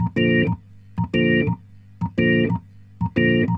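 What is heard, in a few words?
organ, B-3, 90 bpm, roots reggae; Sounds Good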